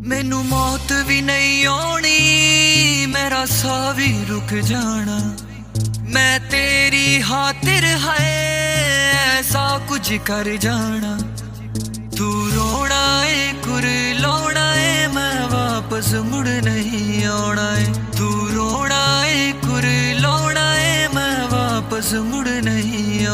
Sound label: Punjabi Trendy Sad Ringtone